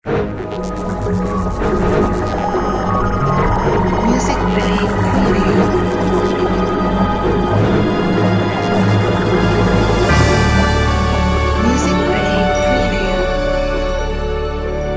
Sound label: Resolution Ident